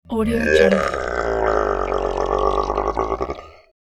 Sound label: monster dungeon enemy